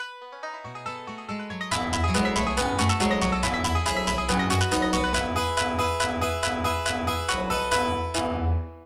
Swamp; cloning clyde; xbox 360

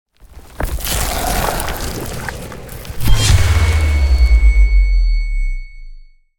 Wind Dungeon Outro; children of morta

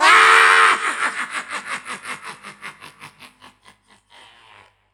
Showman Laught

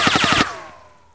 Impact Pow

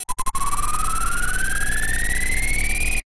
Vs Count; Yu-Gi-Oh! Master Duel

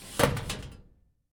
Filer Close; in-game sound effects; Papers Please; android ios windows gamerip 2013

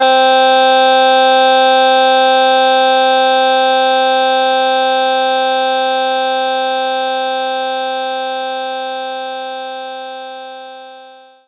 harmonica C4

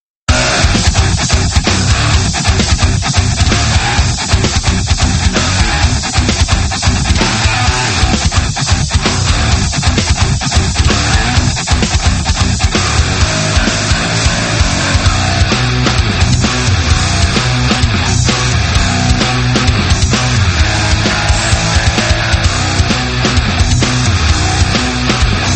Music Rhythm Guitars; Android; monster truck destruction

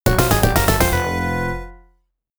Player Lost; Dr. 2 Night Janki; PC-9801

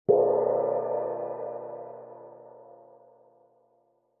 Gong

